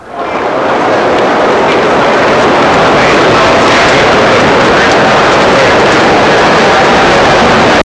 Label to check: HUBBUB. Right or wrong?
Right